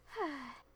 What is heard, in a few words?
sigh; Alice